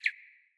Cardinal Male Bird Single Chirp

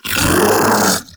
Infested Splitter Joined Vocal Attack Medium; Grey Strain

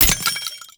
Grenade Launcher Shell Casing; Warframe; Scaldra Grenade Launcher

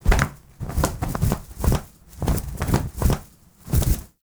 Owl Alerted Stun; Warframe; Duviri; Animals

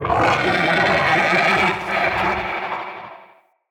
Corrupted Slug Sub Boss Vocal Attack; Creatures

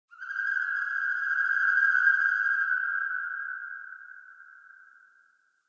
Signature Call; Corrupted Ode

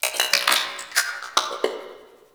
Sentient Lair Flesh Tunnel Critter; Warframe

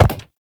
Step Glass; Movement; Uriel; Warframe

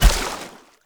Step Water, Footsteps; Characters; Enemy; Mendicant; MndKing

